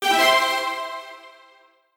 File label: Win; genesis; euro Golden Cup Desktop